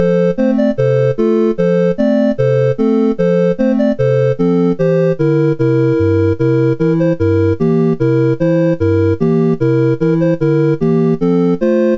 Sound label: sid CSG8580R5, GAMES; Donald Ducks Playground